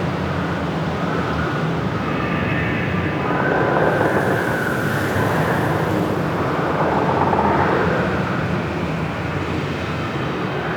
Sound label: Ice Exterior Amb; Warframe; Corpus